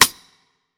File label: rim Snare